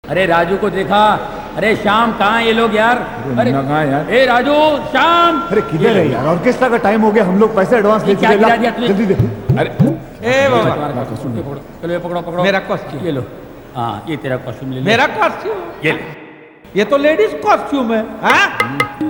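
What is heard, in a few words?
Funny Song Jhan jhan Bass Mix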